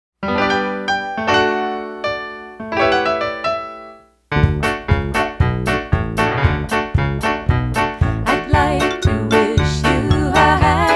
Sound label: A Happy Birthday To You